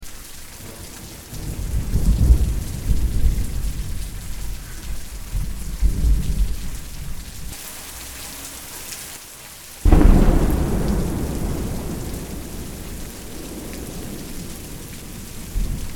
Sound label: Twilight Rain; Eclipseprophet; Rice University